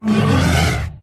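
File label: bear attack middle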